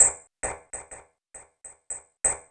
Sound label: Scrape Delay Beat, Electronic Beats; Sonic Foundry (Sony Creative Software); Myths of Technology